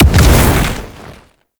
Bow Projectile Fire Explosion; Ultimate Abilities; Madurai; Warframe; Focus

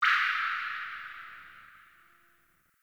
Drip Interior Small; Ambience; Warframe; Sentient Lair

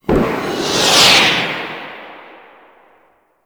Boost Thruster Warp; Warframe; Archwing

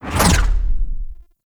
Fly By D; The New War; Archwing; Cinematics

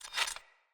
Foley Action Metallic Punch Fill Short; Arm

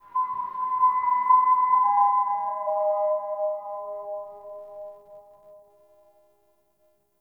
Sentient Lair Texture Interior Small, Ambience

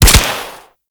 Goo Gun Shot; Warframe; Grineer

